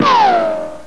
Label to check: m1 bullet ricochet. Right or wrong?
Right